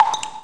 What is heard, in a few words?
DRIP; Shadow